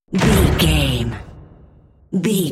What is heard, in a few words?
cinematic drum hit trailer